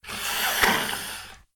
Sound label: Ghoul Growl; SyrinScape; East Ward